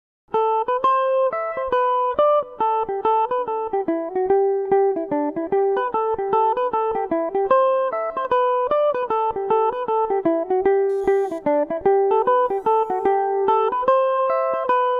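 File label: G major, irish mandolin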